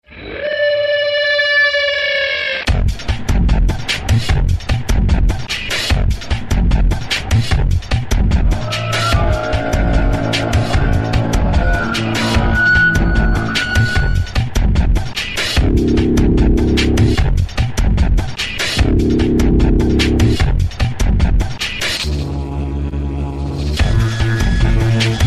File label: ambient; cstrike; zombie plague